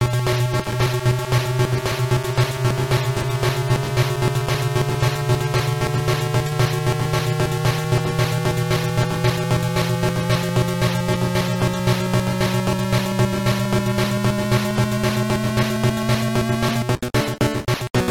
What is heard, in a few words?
Stage; Battletoads & Double Dragon